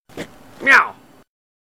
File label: Meow Text